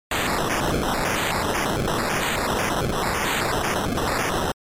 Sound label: Collapse, NES RPG